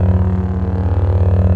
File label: saber hum; mouvement dans l'air; Wooonnnnssss